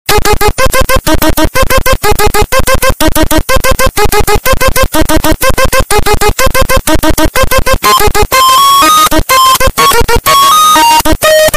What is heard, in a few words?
Earrape; Flowey